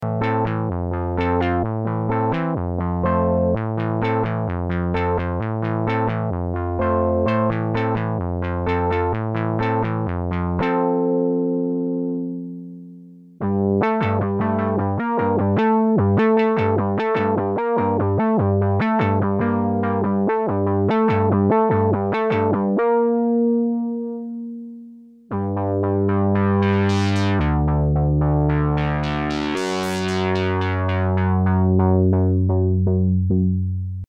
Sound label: poly sound 3 modes